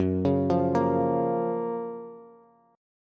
F sharp Major 7th, Bass; arpeggiated; JGuitar

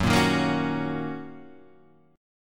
Gb 6th, Guitar Drop D; chordshape; down

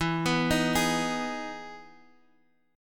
E Major 7th E; arpeggiated; JGuitar

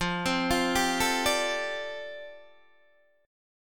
F 6th Add 9th F down Guitar DADGAD, chord

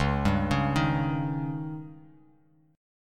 C sharp Suspended 2nd Flat 5th; arpeggiated; 9,10,11,8

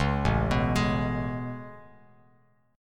A Major 9th A, Bass Standard; AM9; arpeggiated; JGuitar

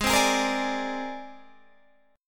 C 7th Suspended 2nd Sharp 5th Ab

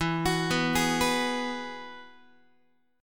E Major Add 9th E, Guitar; arpeggiated; 4A,4E,5A,5D,5G#,6C#

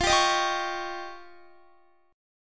F 7th Suspended 2nd Sharp 5th, Guitar; F7sus2#5 strummed; x,20,19,20,19,21

